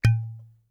Household Glass Wine Bottle Cork Removing

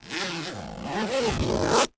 Household Luggage Zipper Zipped

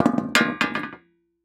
Industry Tool Shovel Set Down On Wood

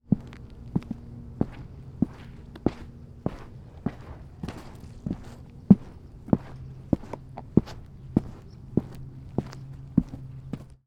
Foley Footstep Walk On Board Exterior; Foley Footsteps

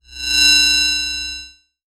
Multimedia Internet CD-Rom Flash Electronic

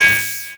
Multimedia Internet CD-Rom Flash Beep Electronic

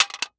Electronic Audio Tape Case Fall To Ground

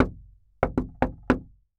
Household Wood Door Knock Rhythm

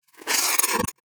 flutter medium, Mograph Mini Transitions; CinemaSpice

